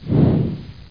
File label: Wind Blows; india